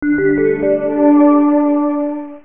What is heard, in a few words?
Dream Chord; FRACAS 1.2.1; Fracas Sounds